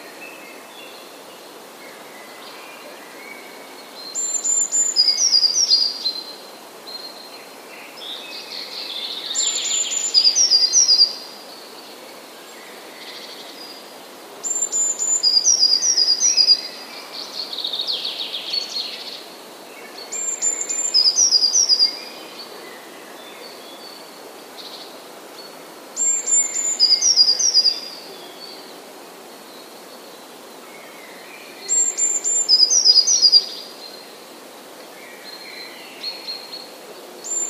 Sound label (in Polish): spiew